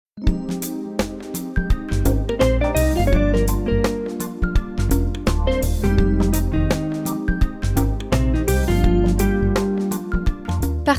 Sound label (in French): jingle; Esperluette; En Vaucluse